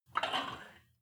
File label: weight rack pull out